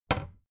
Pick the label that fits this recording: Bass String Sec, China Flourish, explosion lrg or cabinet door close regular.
cabinet door close regular